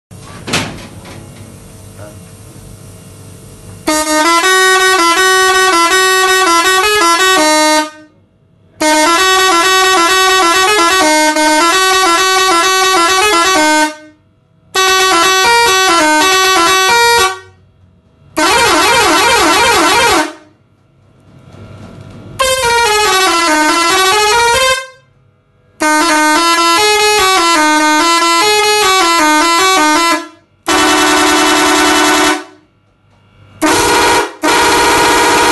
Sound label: Pakistani Horn